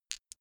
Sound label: dice clatter